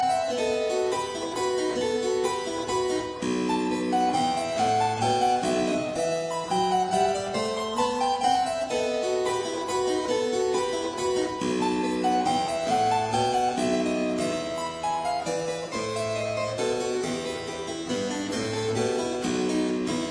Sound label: Keyboard Sonata; in A minor K 451